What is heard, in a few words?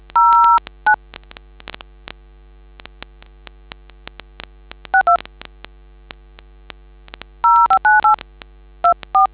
dtmf